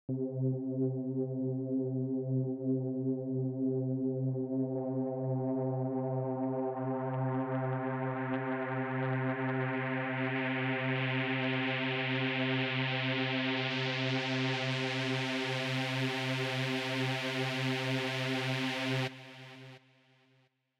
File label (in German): Yamaha MX61 treppeneffekt